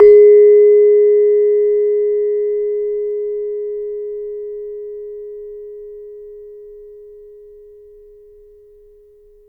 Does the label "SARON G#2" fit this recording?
Yes